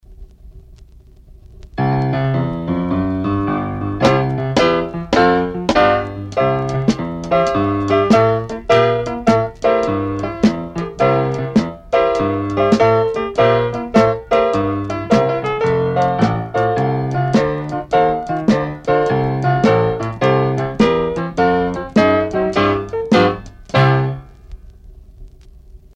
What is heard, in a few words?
boogie woogie